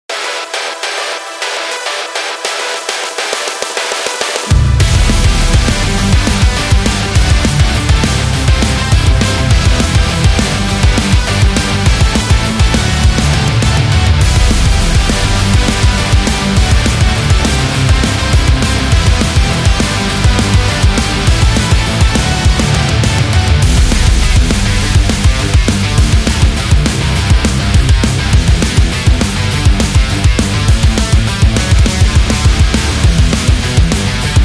Race

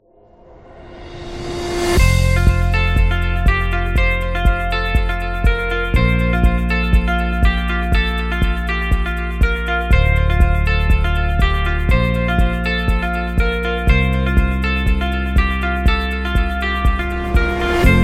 Uplifting Presentation, corporate music; corporate music vol 2

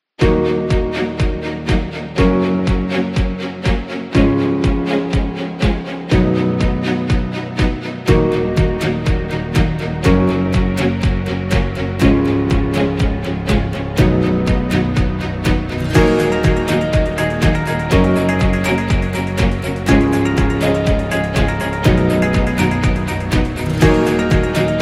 Corporate Orchestra; corporate music vol 15

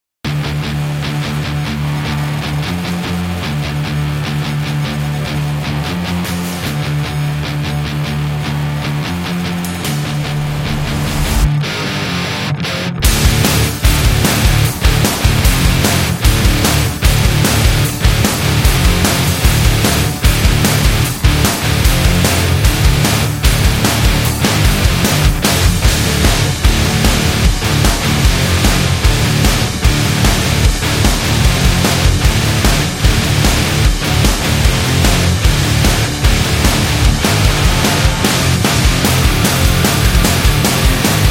Agressive Powerful Hard Rock

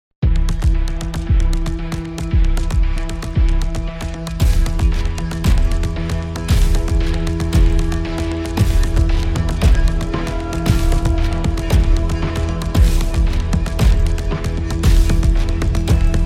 A Thriller

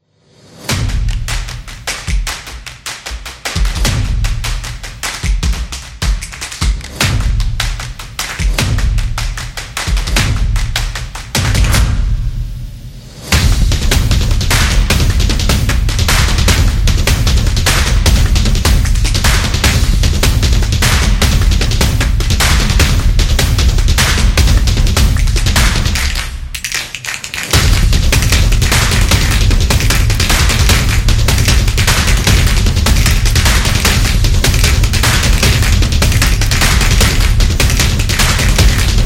Stomp Clap Slap; percussive nation vol 10

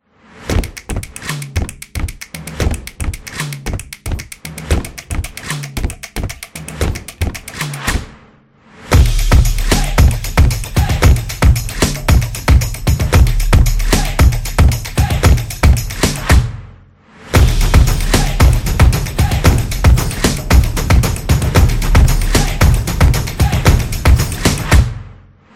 Dynamic Stomp, drums trailer

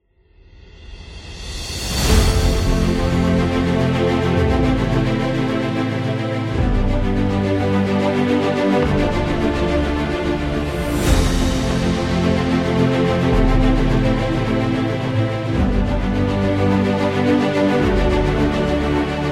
Epic, epic music; epic music vol 2

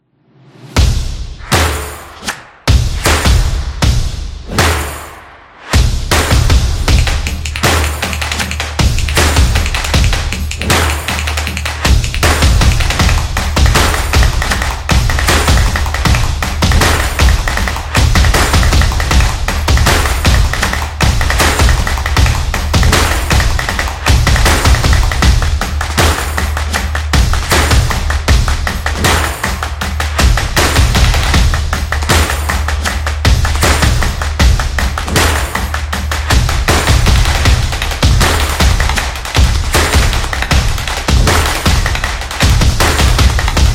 Horror Comedy

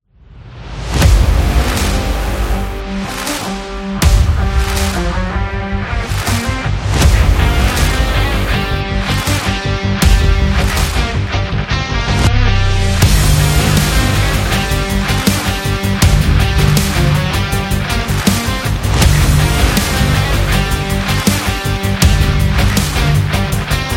The Indie Rock; electro rock

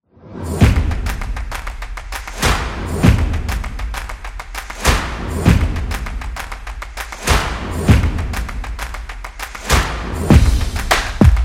Advertisement

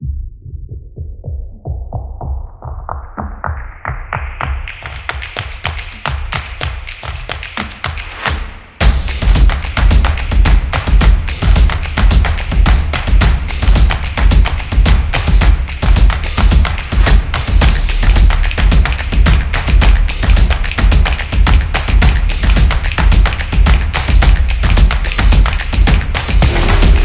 The Drums Claps; graffiti

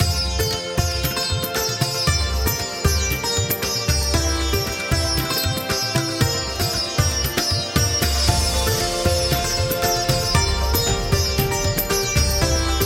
Happy India

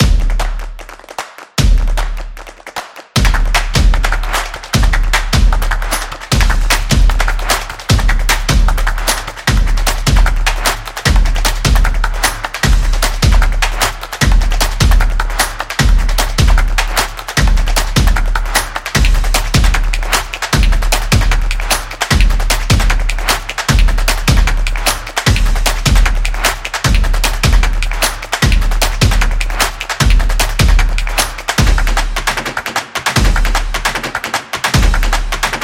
Catchy, upbeat drum

